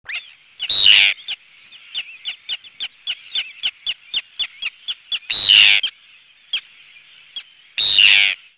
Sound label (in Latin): sterna hirundo